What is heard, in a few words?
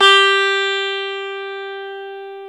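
E 12 String guitar; Club-50 - Foundations Roland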